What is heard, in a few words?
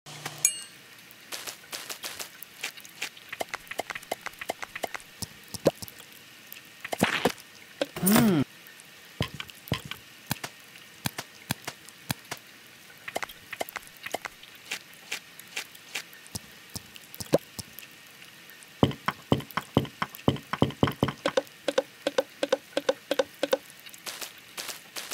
Cooking game